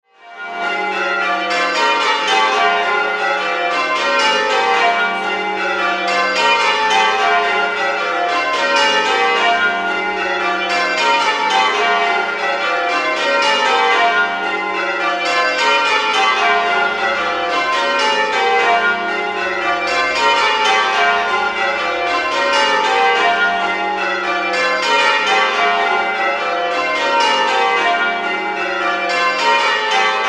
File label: Bungay Rounds, bells